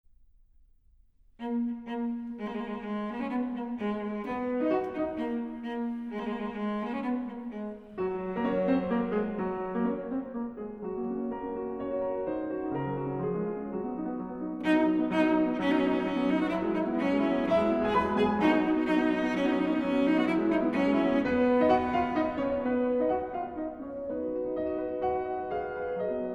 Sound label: Duo Arp